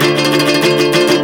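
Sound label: OCT GUIT MEL, OCT GUIT MELODY; Best Service; World Colours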